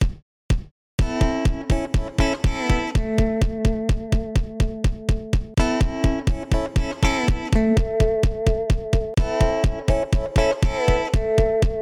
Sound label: Country Ringtone